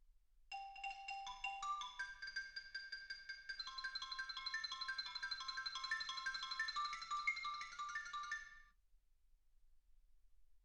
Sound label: xylophone